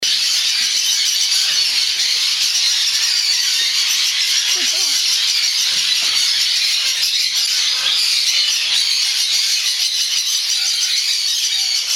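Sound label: Pyrruha Conures (green cheeked), parrot calls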